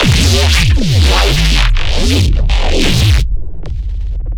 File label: Phase Plant, bass